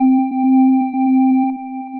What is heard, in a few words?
Floating Grit Pad, Synth Pad; Ableton; Beat Tools